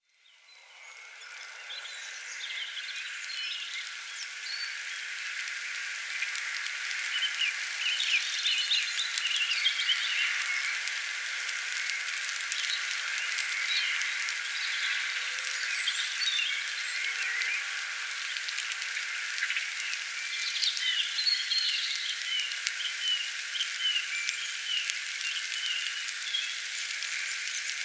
melkii dozhdik i lesnie ptitsi

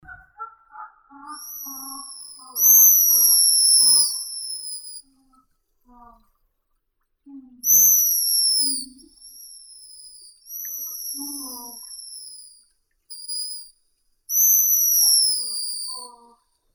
chistik tihookeanskii cepphus columba

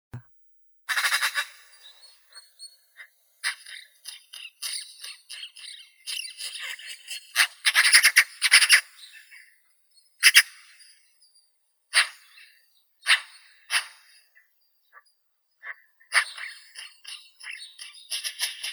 soroka pica pica, zvuki prirodi

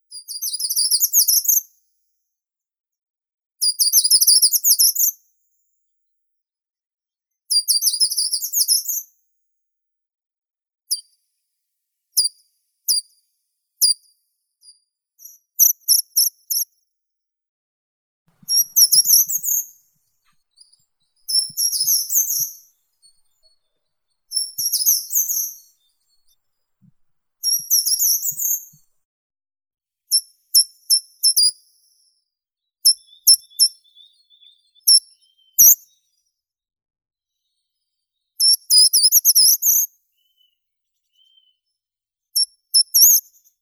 pishuha korotkopalaya certhia brachydactyla; zvuki prirodi

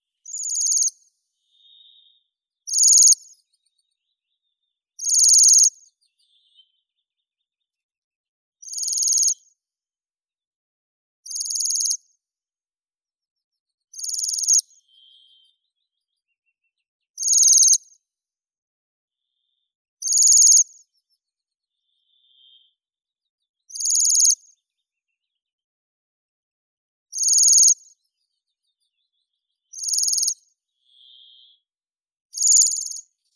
sviristel obiknovennii bombycilla garrulus, zvuki prirodi

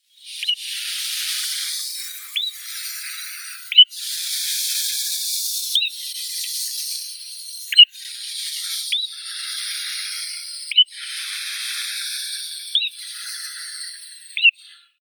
pogonish kroshka porzana pusilla ptenets; zvuki prirodi

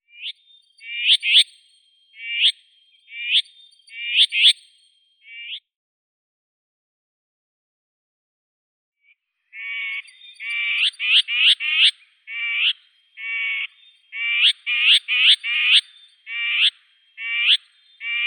slavka seraya sylvia communis, zvuki prirodi

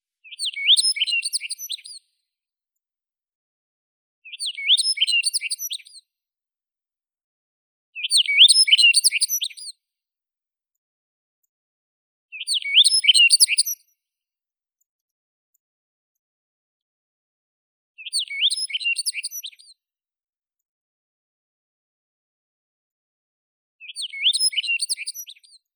ovsyanka remez emberiza rustica

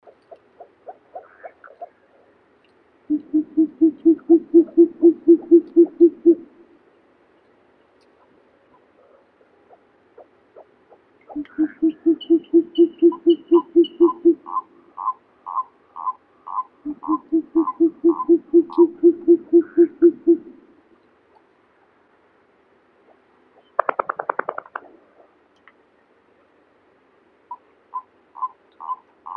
sova bolotnaya asio flammeus, zvuki prirodi

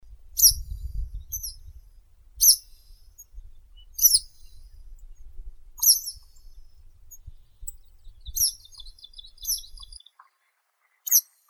zvuki prirodi tryasoguzka vostochnosibirskaya motacilla tschutschensis

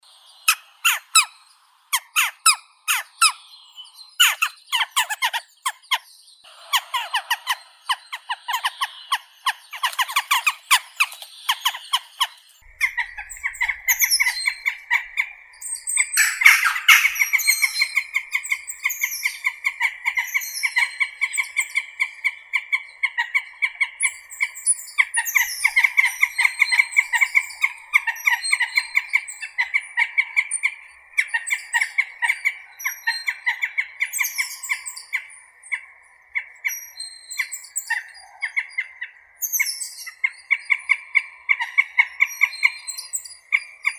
galka corvus monedula

